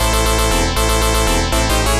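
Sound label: background music; Legendary Wings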